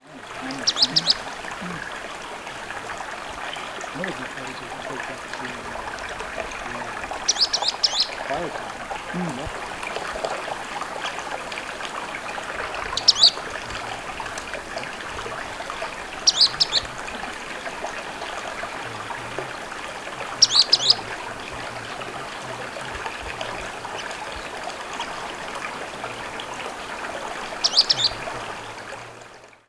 FLYCATC Tufted

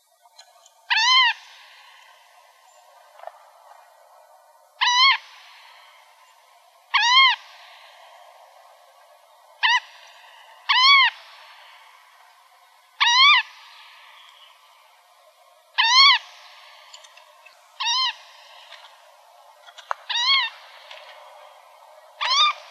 CALLS, Emberizids; GTTO; deviche

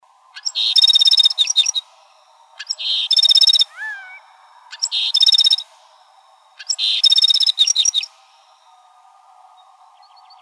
Emberizids; BTSP; deviche

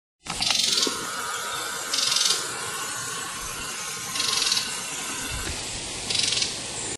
Ratchet Call, Wrens Kinglets Gnatcatchers; Woodley

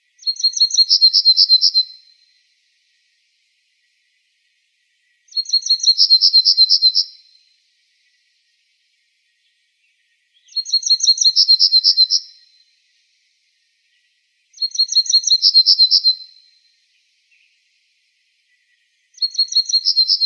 Emberizids; deviche

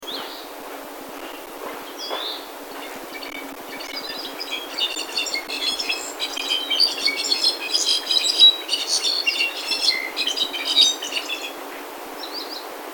Brown Back Solitaire, Huachucas, Thrushes

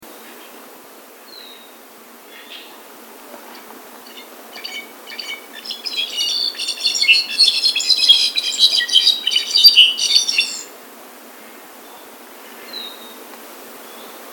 Brown Back Solitaire, Huachucas, Thrushes

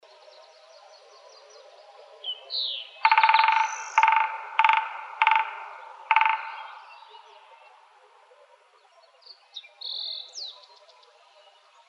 Woodpeckers, drum; WISA; deviche

